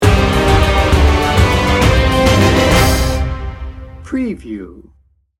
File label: magnificent adventure sting bumper